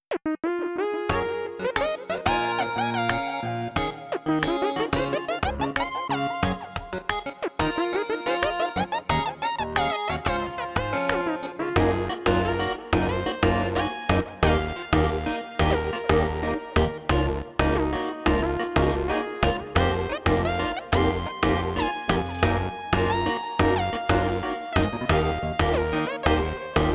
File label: electronic version